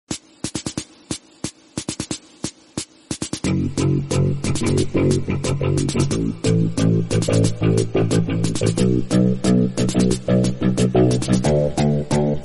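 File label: interval background music; kaththi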